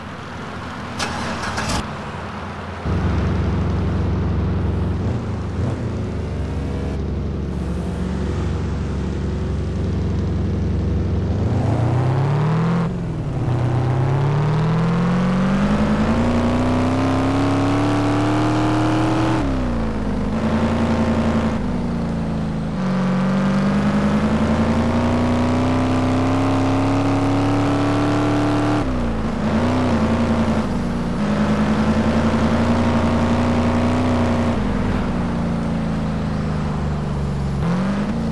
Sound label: mustang mk1 custom; 1965; Ford [USA]; tdu